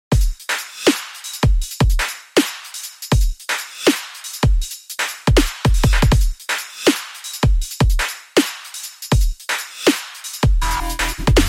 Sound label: Dubstep Robotic